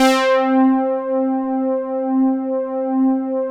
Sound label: OBERHEIMS